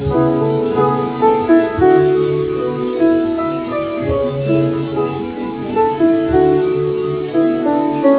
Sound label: musical